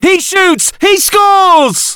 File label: goal